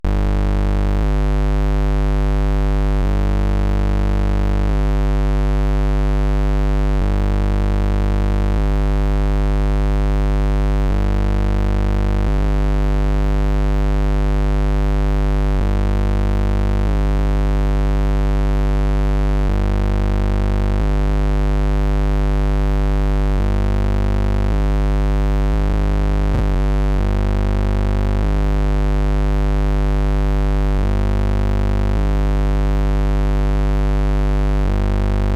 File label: Toy Synth Dirty Deep LFO Bass Modulating Wildly, Circuit Bent Toys; Major Morgan; Submerged Tapes; Circuit Bent Toys Volume 1